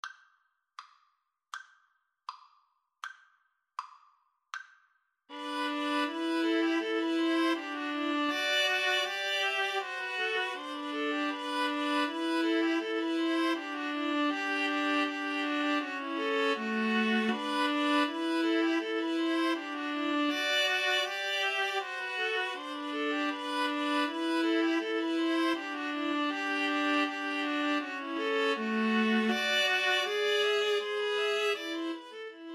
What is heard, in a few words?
Gavotte Cello Suite 6, Viola Trio; Bach